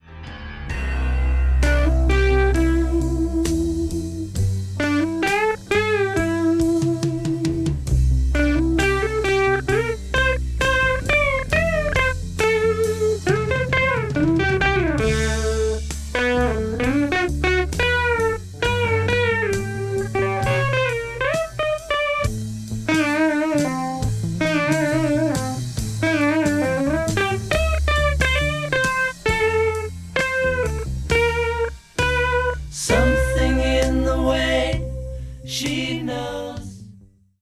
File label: some lead